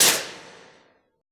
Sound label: CONS T, Classical Choir, CONSONANTS; Best Service; Extended Classical Choir